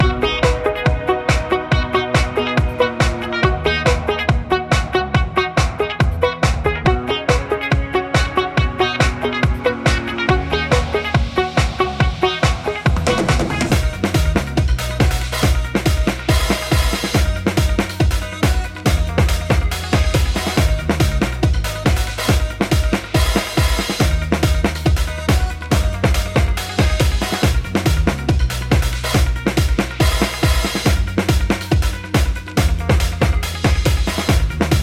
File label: American Rock Roll, rock sound